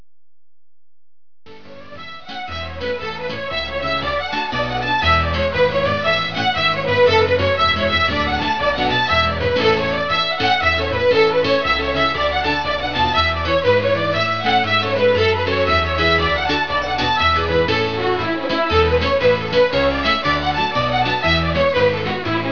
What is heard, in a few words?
Jig